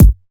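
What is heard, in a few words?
Kick one shot; Sonic Foundry (Sony Creative Software); Club Joints and Street Anthems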